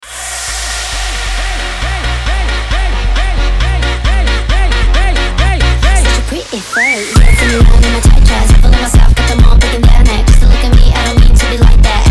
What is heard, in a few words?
sped up